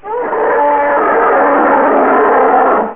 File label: t rex sound